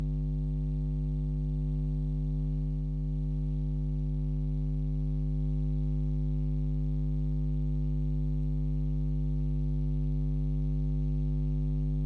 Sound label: Electromagnetic Fields, Hummin; electric fields